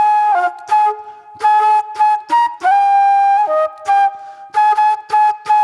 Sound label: Flute Noir; Sonic Foundry (Sony Creative Software); Manhattan Flutes